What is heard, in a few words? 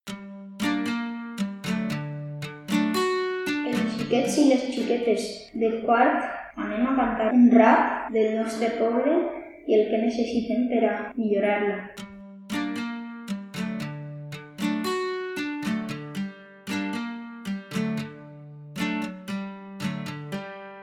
Rap